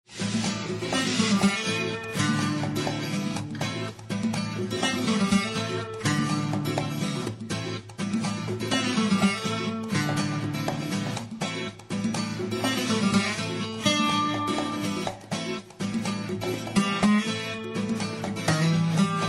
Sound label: bomb planted; music kits custom